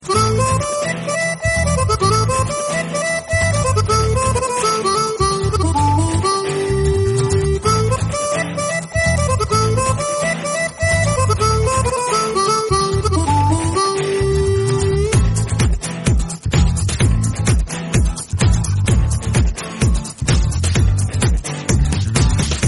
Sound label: heart touching banjo music tone ringtone; galaxy ringtones